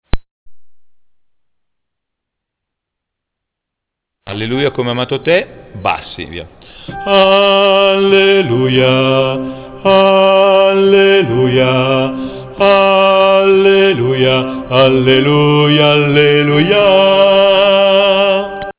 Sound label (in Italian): Bassi Alleluia